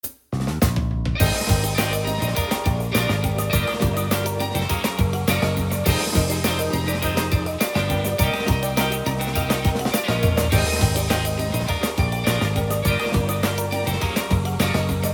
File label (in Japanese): inst; 10連ガチャ大爆死